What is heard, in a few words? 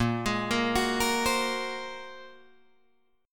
Bb 7th Suspended 2nd Sharp 5th Bb, Guitar Drop D; 8,11,8,11,11,8